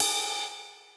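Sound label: drum ride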